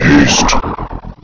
haste

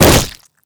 gore splat

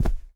object fall impact

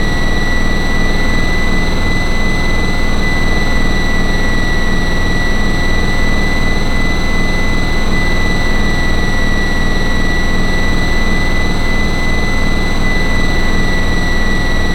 ambience

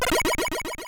teleport out

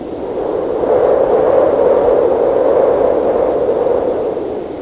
door wind

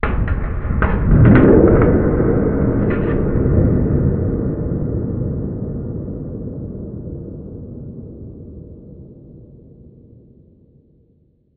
big hit far

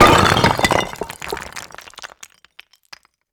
rock hit

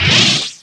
sword off

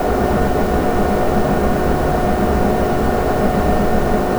lab ship environment; dm research vessel

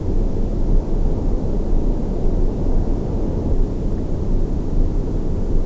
ambi int

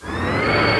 minigun rotate up

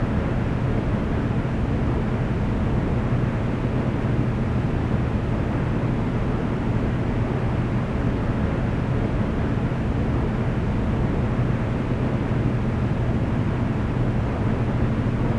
ambience

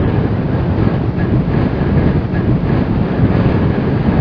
wind

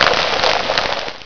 sqk blast, squeek

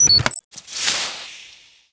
health

